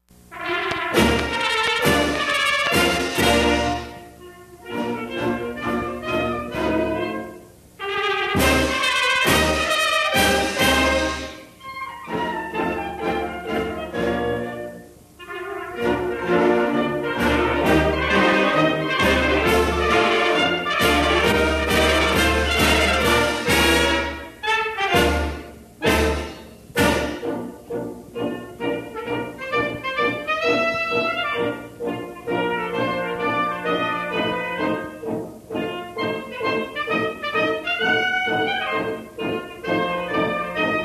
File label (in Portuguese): Hino Nacional Brasileiro instrumental